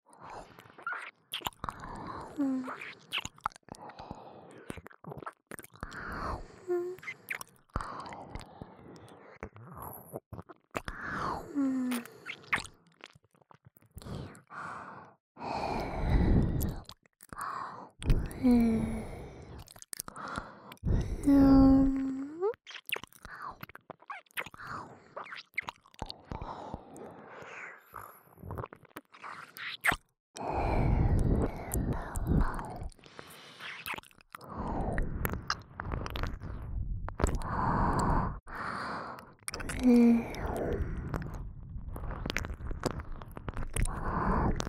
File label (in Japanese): ねっとり右耳舐め, ASMR; 耳元ループ; おまけループ（オナサポ）